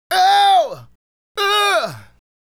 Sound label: Male Solo, Hip-Hop and RnB Vocals